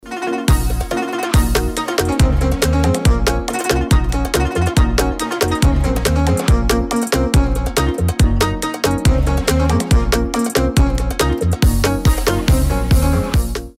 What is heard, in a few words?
instrumental